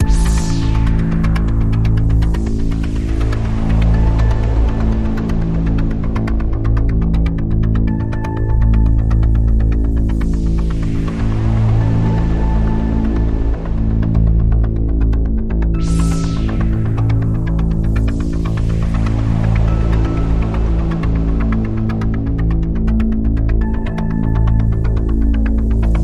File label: Radio Toolkit Pad